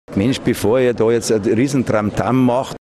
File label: tramtram; Beckenbauer